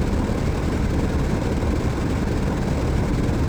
Aircrafts Engines Packard Merlin Prop Cockpit Engine In; DCS